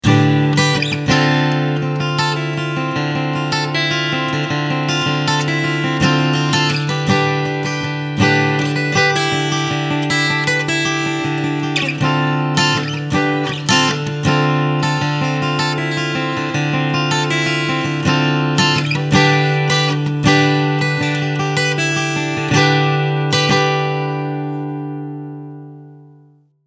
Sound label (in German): Strumming Mikroabnahme